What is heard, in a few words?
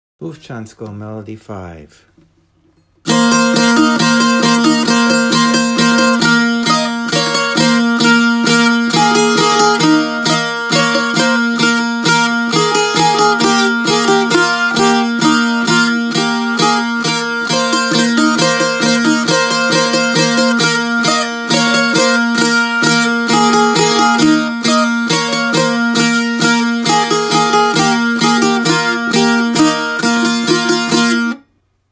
tambura; Bufcansko